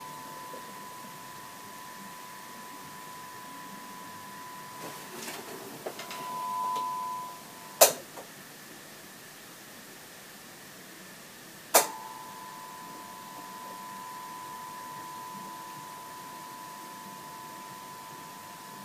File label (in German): lighting ever led 7 watt brummen